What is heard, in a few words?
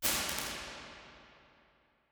Arena Soundcheck, Large Rooms, IR Samples